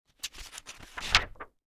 page flip

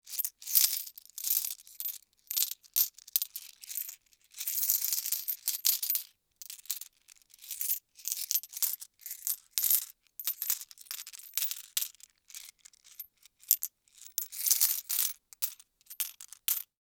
coins in hand

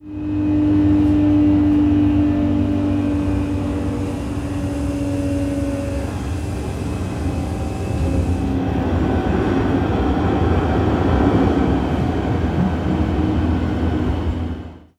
metro moving slow interior; transportation

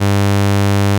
fail buzzer

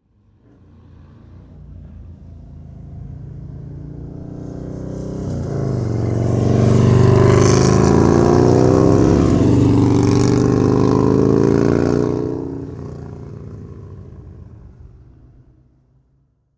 motorcycle pass by; transportation

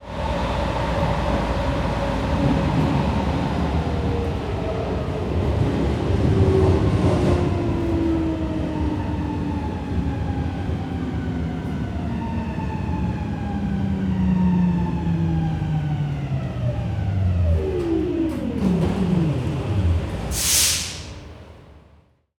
metro ride stopping interior; transportation

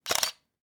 camera shutter click

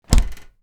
freezer close

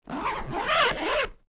bag zipper, cloth

